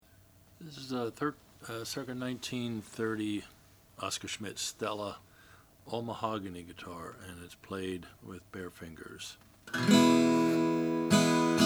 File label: oscar schmidt stella mahogany